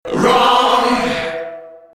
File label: sms